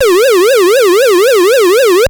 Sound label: Siren; Graz